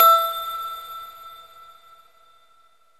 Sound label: LINEAR SYNTH